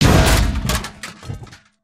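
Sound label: Generator Hit; ambience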